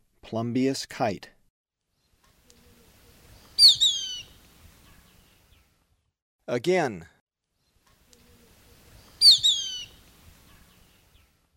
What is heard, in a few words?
Plumbeous Kite; S. America; Eastern Lowlands